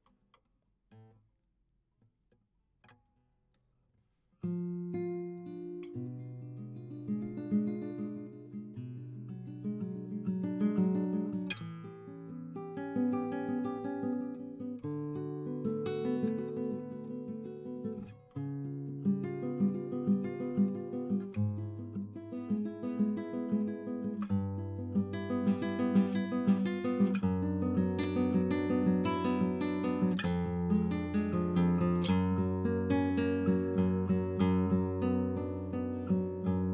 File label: GTR